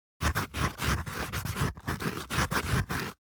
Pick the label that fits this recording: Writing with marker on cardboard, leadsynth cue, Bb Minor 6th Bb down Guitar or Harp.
Writing with marker on cardboard